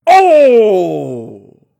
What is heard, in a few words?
ohh